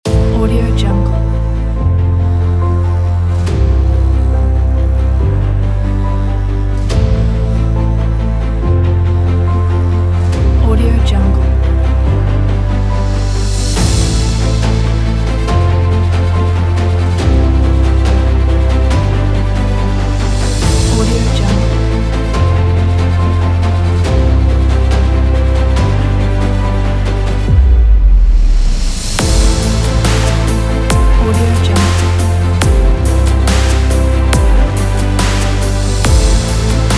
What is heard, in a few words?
dubstep trailer inspiring; kenny-music